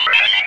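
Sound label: sphere blips medium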